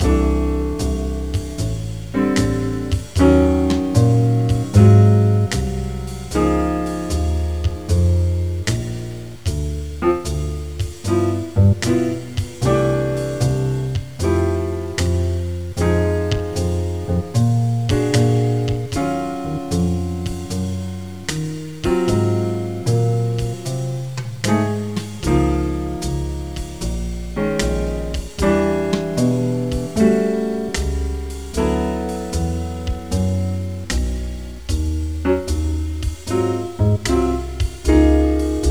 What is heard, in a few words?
Jazz Loop; Uchuu Seibutsu Flopon-kun P; PS1